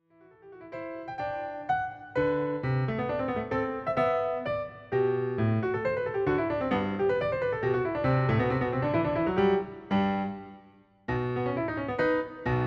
Keyboard Sonata in E Major, Finale - Tempo di menuet; Keyboard Sonata No. 37; Hob. XVI, 22; haydn; Piano Sonatas 35-38